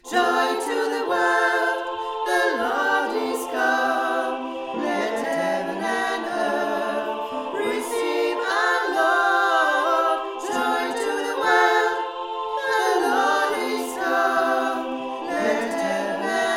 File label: tutti; joy to the world